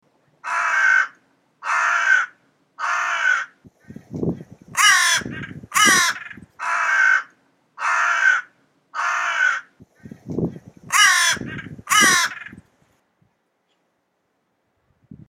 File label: Crow Sounds & Calls